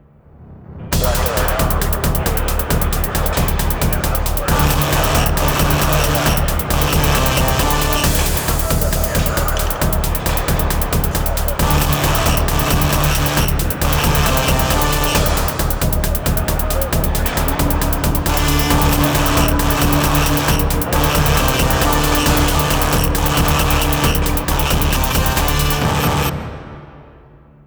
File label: Factory Music Loading; Battlefield 3